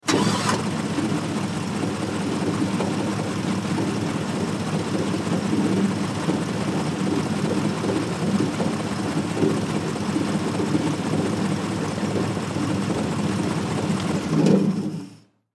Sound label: Air Boat On Idle Turn Off; Transportation Watercraft; Boat Sound Effects; Blastwave